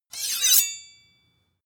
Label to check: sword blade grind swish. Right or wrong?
Right